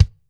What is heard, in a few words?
Bassdrum, Yamaha RX-21; Drum Samples